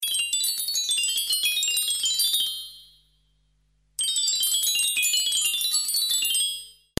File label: Relaxing Chime